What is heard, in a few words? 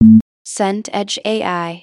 beep low